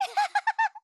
ハッハハハハ

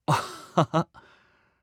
アッハハ！

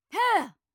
攻撃

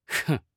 フンッ

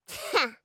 ハン！

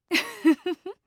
うっふふふ

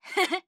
ヘヘッ！

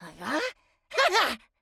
ハハッ

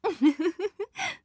うふふふふ

